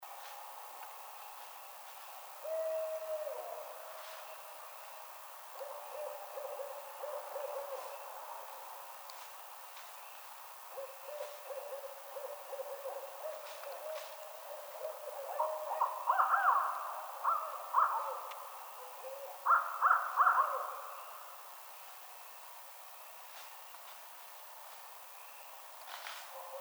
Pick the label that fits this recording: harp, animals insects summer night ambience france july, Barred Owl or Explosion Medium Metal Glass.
Barred Owl